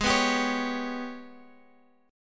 Ab Minor Major 7th Double Flat 5th, down, Guitar, Open G